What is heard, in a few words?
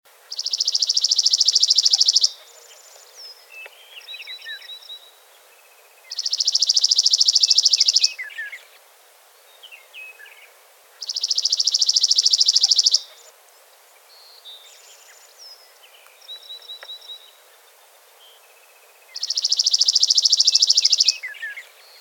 chipping sparrow; andrew spencer